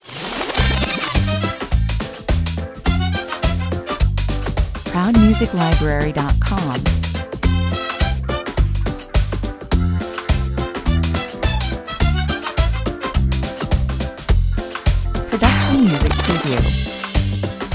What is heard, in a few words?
sunny day jingle, 105 bpm